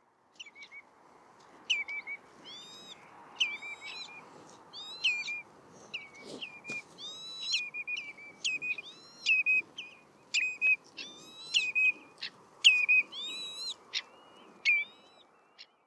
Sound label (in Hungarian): piroslabu canko Tringa totanus